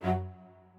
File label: strings